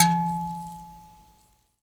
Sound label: PLK Grand Mbira